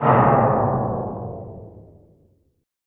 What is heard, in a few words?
power drain; Endless Chimney